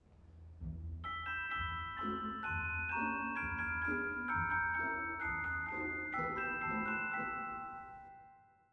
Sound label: ORCH, Piano; Tchaikovsky; Fee Dragee; Practice Trials